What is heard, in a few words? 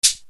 RX7 SHAKER, Yamaha RX-7